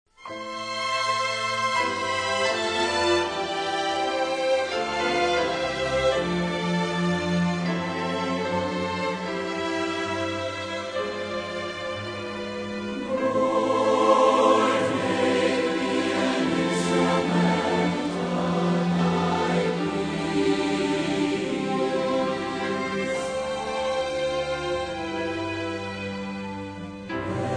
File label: Choir And Orchestra